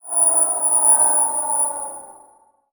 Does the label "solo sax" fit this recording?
No